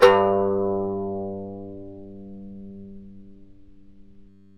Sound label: KANUN G1